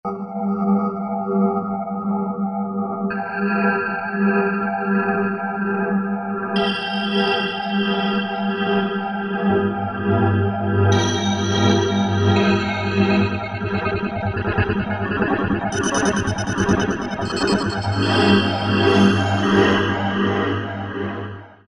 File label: Yamaha TG500 ME grind